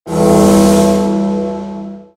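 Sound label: mahou on, magic sound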